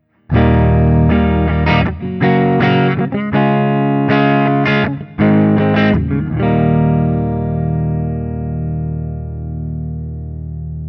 Crossroads Doubleneck 6 ODS100 Open, Open Chords; Guild Slash Crossroads Doubleneck; Open Chords #2

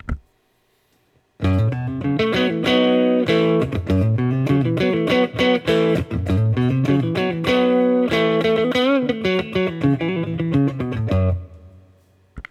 Guild Bluesbird Bridge Tiny Tweed, guitar; 2016 guild newark street bluesbird guitar

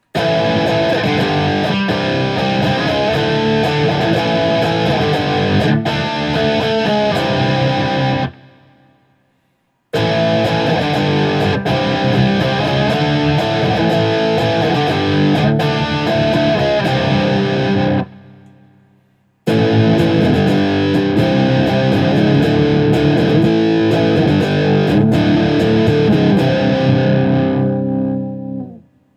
1977 Guild S60 Barre; New Guild Shape guitar from the late 1970s called an S-60

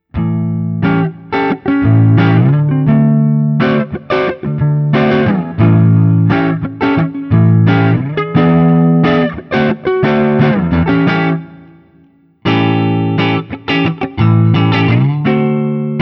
Guild M75 ODS100; Aristocrat; Gold; goldtop; 2014 Guild Newark St. M75 Aristocrat in Gold

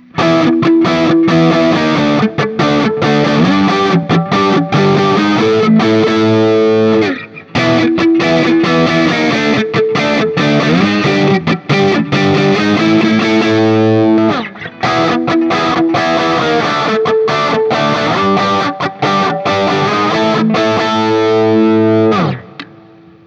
Guild T Bird ST 90 JCM800 A, guitar; P90; Guild T-Bird ST P90 in Pelham Blue from the Newark St. collection

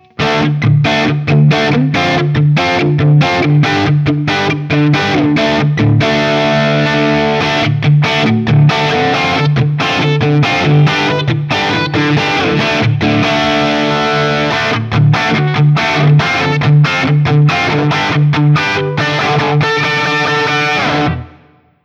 Guild X79 JCM800; 1982; guitar

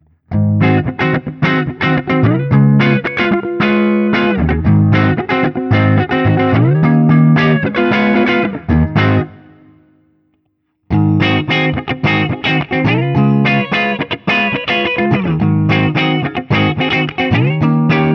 Guild Crossroads Doubleneck 6 ODS100; Guild Slash Crossroads Doubleneck; 7th; JCM-800 (6)

